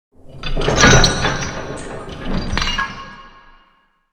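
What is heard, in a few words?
big chains sweetener close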